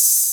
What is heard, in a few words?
Open Hat